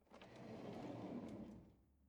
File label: drawer cutlery draw in slow